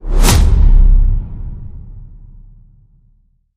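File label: Hit Swoosh Hard Slap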